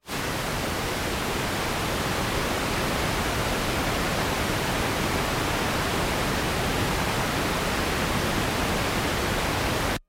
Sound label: whitenoise mp3stream mono